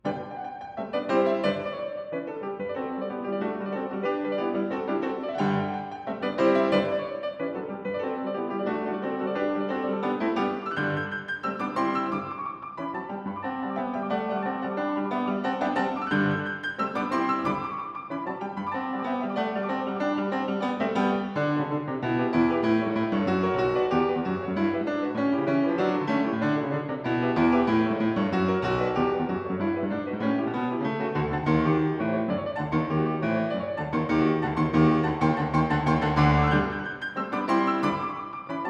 Character Dances Trépak (Russian Dance) (Arr. Piano); Tchaikovsky- The Complete Solo Piano Works